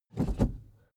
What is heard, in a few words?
suv pull back gear across